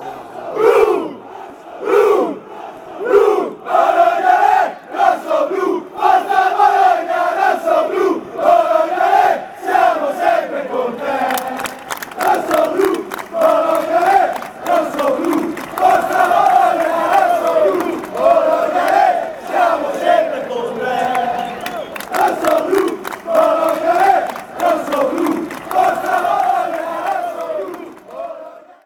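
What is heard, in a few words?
red and blue, football chants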